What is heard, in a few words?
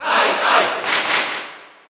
Cheer Dutch; Ike; SSBU